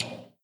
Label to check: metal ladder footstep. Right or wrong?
Right